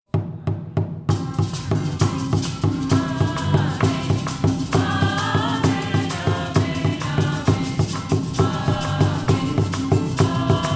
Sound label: amen